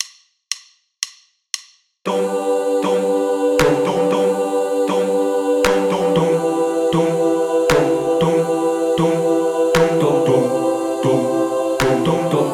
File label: verse clap